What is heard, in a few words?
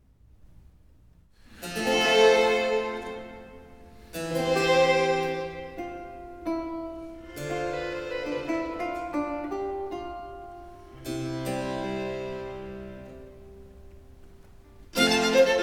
Sonate 10 in G major 1. Preludio, adagio - allegro; Arcangelo Corelli; Corelli - Complete Works; Klassika